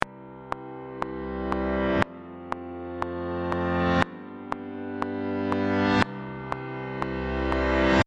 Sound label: reverse